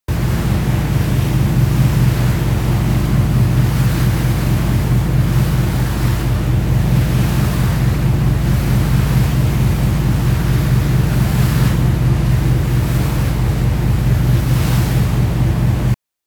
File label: boat onboard ferry; best service Blue Box; sea traffic